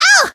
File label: damaged; loveli